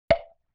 Temple Block Hit; Vintage Cartoon; Drums & Percussion

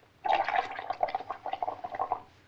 LoFi Group Soda Suck; Vintage Cartoon; Comedy Vocals

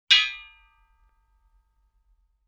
Brake Drum Hit High; Vintage Cartoon; Drums & Percussion

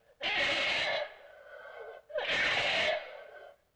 Raspy String Can Pull; Vintage Cartoon; Foley

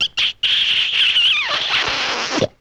Kiss With Pop, Vintage Cartoon Comedy Vocals

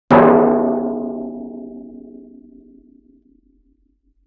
Oil Drum Hit; Vintage Cartoon; Drums & Percussion

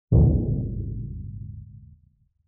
Timpani Hit; Vintage Cartoon; Drums & Percussion

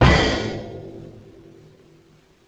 Bass Drum Cymbal Hit; Vintage Cartoon; Drums & Percussion

